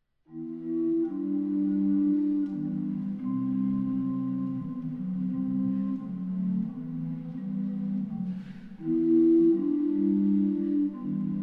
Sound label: Ocarinas